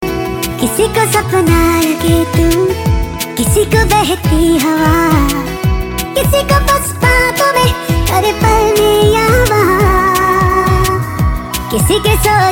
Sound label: Duet Funny